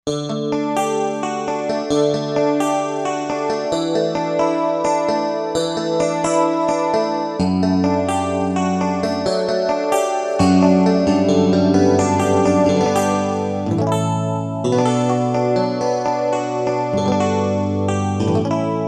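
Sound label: Guitar Glass Strat, synth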